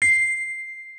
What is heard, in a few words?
bell c7